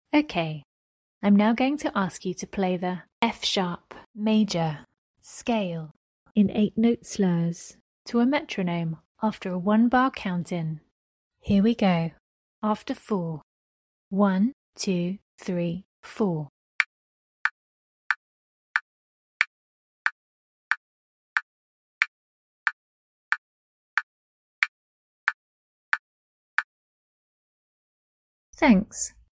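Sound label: f sharp major scale 8 note slurs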